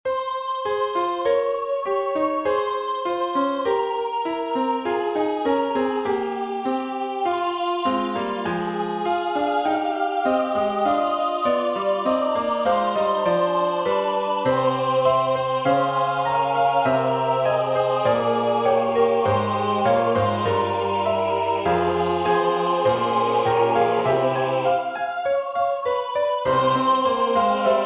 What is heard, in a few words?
Stabat mater Chur SATB Amen; G.B. Pergolesi